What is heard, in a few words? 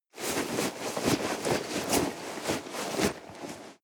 nylon leather hard long